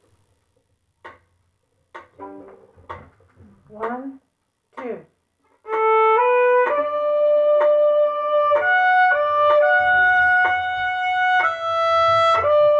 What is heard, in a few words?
Violin Amazing Grace 1 time